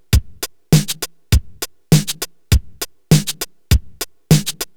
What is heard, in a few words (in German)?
IO Labs Flux Vierviertel Pattern